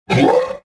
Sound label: pain75